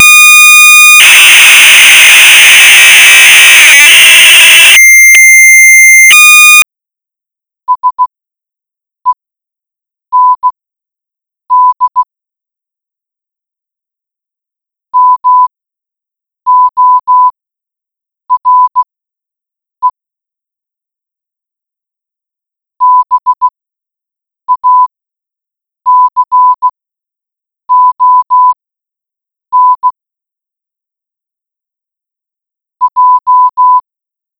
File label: morse code decoder example; Gopher2600; supercharger